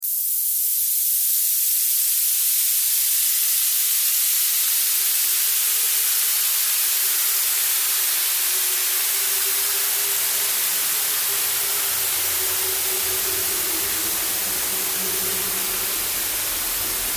Sci-Fi SFX

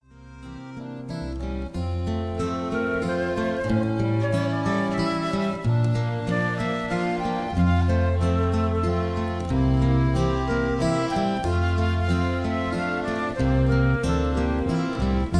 Irish Songs, Key F

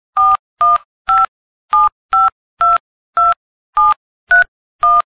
cell long dist dial

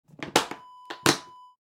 case latch close

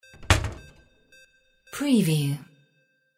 DOOR CABINET SMALL; windy beach audio

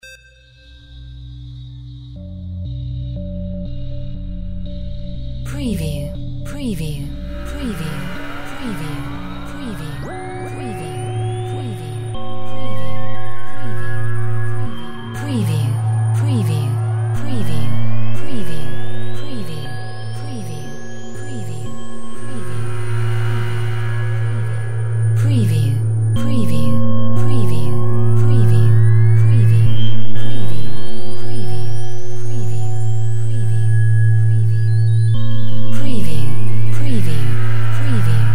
drone matrix random fx

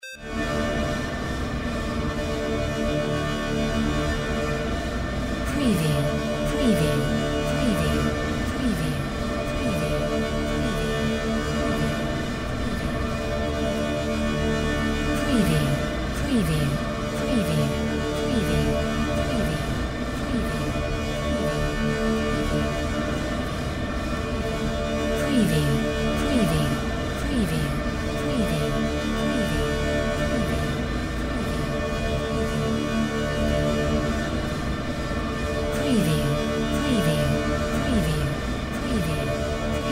drone brass generator

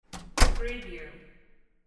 door close

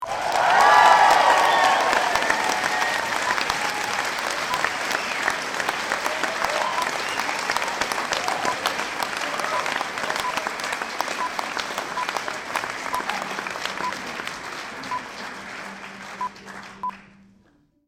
Applause

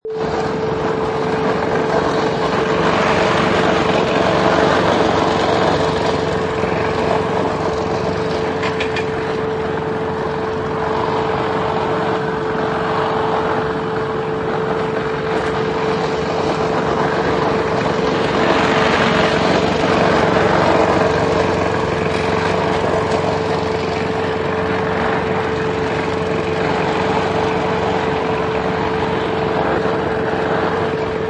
Lawn Mower

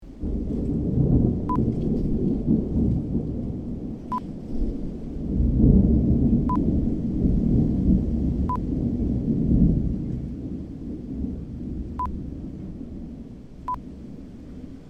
Distant Thunder

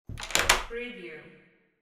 door close

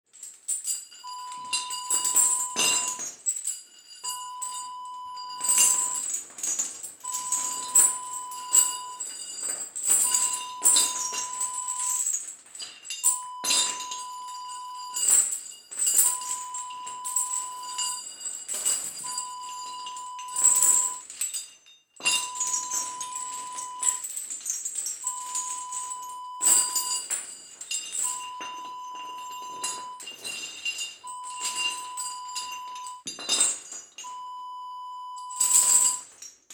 chain rattle

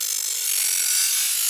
NOIZ LOOP

